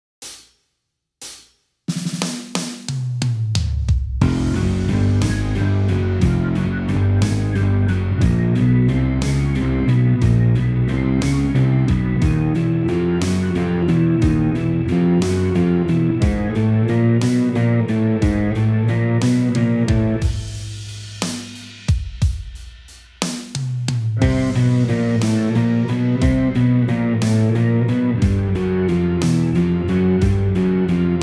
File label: Bass - Advanced Linear Playing Exercise; Rocksmith